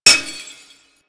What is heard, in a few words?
Glass Impact; Warframe